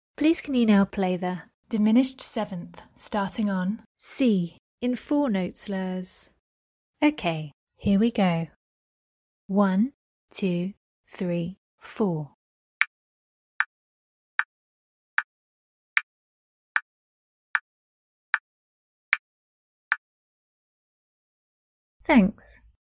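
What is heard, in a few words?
diminished 7th starting on C 4 note slurs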